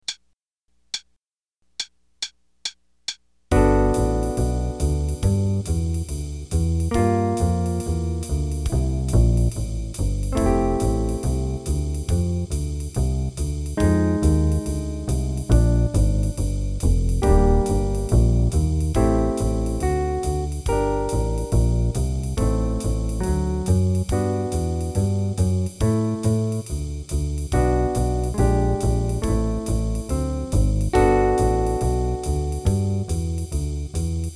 Play a long, 3 choruses qn=140, Jazz Improv Play-a-Long